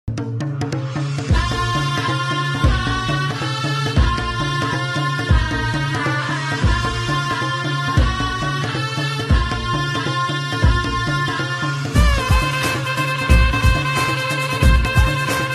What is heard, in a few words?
edm ringtone, romantic ringtone, love ringtone, flute ringtone; abcd 2; hindi ringtone